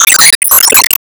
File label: Team Ball Fly